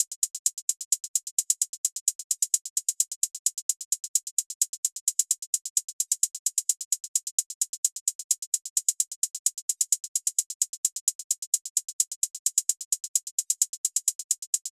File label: Drum Loop - 130 BPM Hihat; Loop Stems; Cymatics; DOPE DRUMS VOL. 1; Hunted Drum Loop